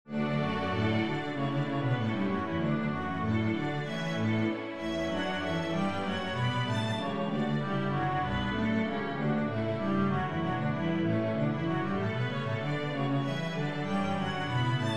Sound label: Brandenburg, string bass